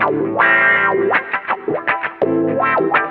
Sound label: GUITAR